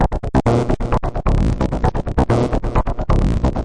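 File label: nasty rhythm bass, 131 bpm; SYNTH AND ELECTRONIC LOOPS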